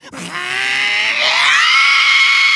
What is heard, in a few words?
high scream start